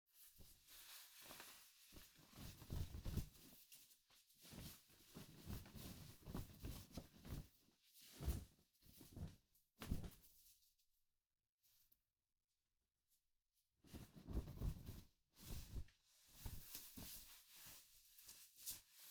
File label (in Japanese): シャツ を 着る; 3DIO; 色々な動作